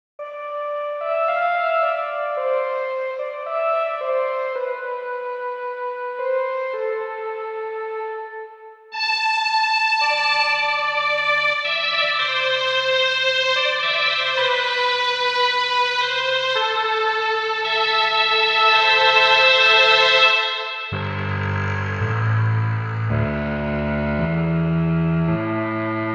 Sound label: Classic Trons, tape sampler; electrickeys